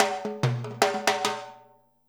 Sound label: FILL, Percussion